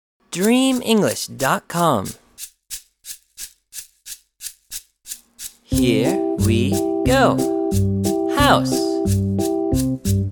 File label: chants